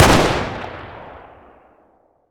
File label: m3s90 distance fire